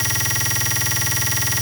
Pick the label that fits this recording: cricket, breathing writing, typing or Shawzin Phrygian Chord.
typing